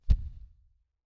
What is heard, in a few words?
bell Height Lock; Desert SFX